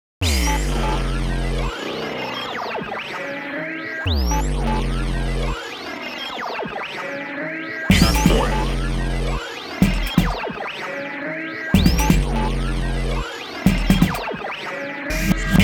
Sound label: Checkpoint 125; AMG - Ruff Cutz